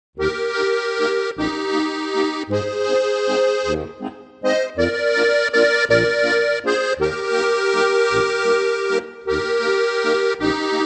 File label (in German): walzer; Mein erstes Spielbuch